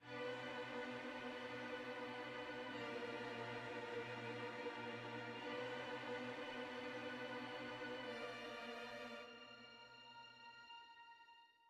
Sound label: music interactive; World of Tanks